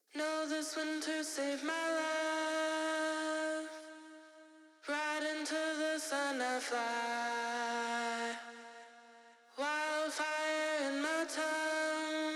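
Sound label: Disto Vox LEMON Stereo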